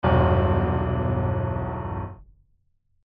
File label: low over limit